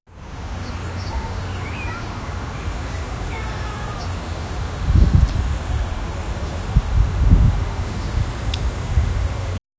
Rio de Janeiro, tropical; sound of rio de janeiro

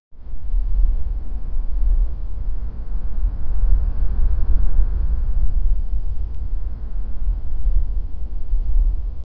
distant echoing cry, animal cry

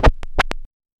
short gritty vinyl record

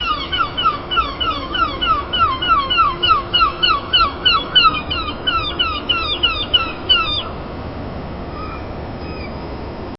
soft slow call of a, coastal

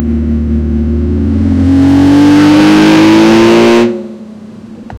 g class driving towards, acceleration

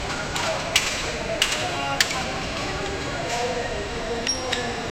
firm handshake sounds; human interaction

